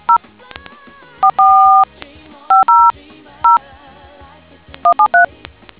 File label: dtmf